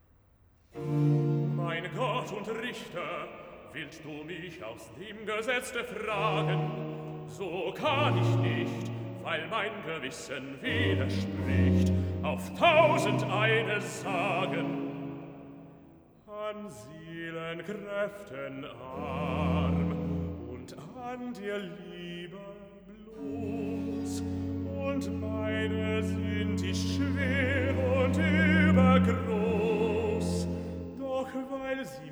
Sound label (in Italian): Cantata, Recitativo (Bass); BWV 33 'Allein zu dir, Herr Jesu Christ'; 'Mein Gott und Richter'; Bach; Frankfurt; 13th Sun After Trinity